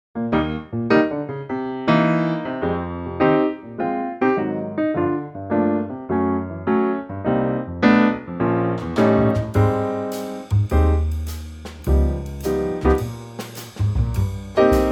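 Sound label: Eb Trio, Backing Tracks for Male Vocals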